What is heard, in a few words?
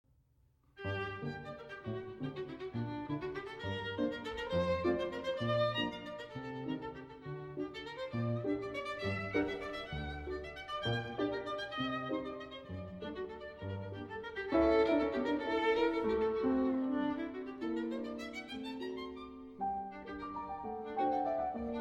violin and piano; Saint-Saens Sonata No.1 For Violin and Piano in D minor, Op.75; 3. Allegretto moderato